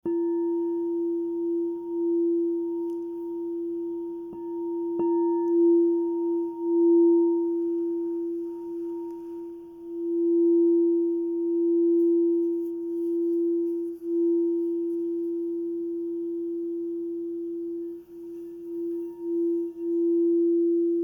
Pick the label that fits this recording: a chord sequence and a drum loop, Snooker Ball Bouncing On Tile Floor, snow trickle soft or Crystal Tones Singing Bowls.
Crystal Tones Singing Bowls